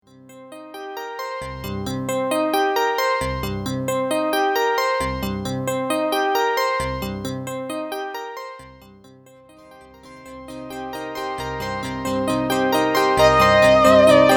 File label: Lexicon Echo